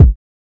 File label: verby bass drum single shot a sharp key; modern kick drum